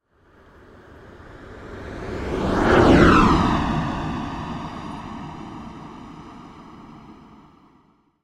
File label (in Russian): zvuki meteorita asteroida